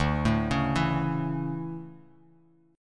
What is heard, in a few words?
D Major 7th Suspended 2nd, D, down; arpeggiated